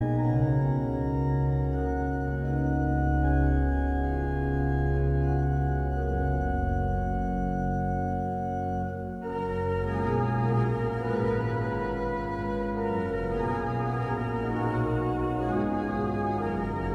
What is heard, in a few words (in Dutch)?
orgel